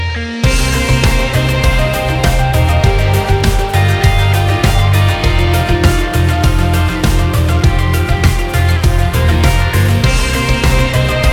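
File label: electro hardcore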